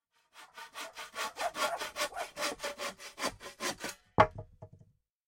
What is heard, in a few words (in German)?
geraeusche werkstatt